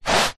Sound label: foley feet barefoot scuff single carpet on wood floor